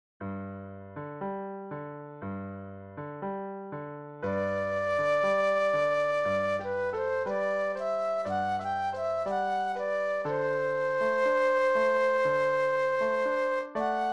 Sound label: Flute Solo